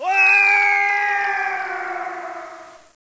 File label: Seeker Fall; Icebreaker